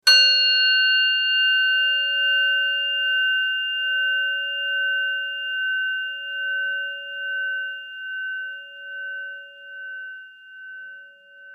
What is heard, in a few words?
Orin chiin